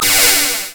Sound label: laser